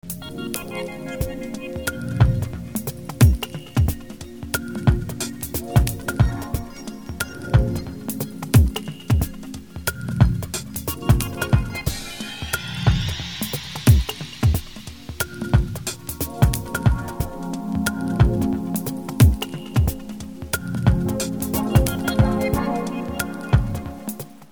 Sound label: ambient